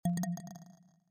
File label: tone